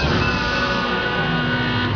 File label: eliminated; usa